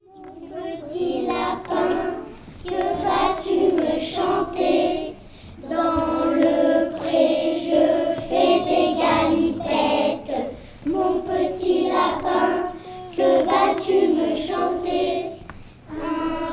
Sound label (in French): mon petit lapin; maternelle